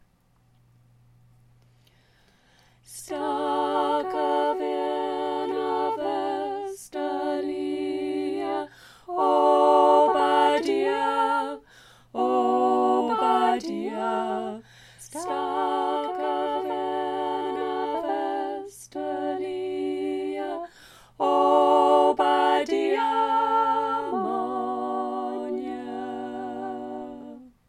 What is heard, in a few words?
unst boat song all, community choir